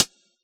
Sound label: HH Hard Closed, Yamaha DJX; DJX Kit